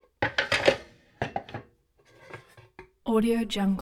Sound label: wood utensils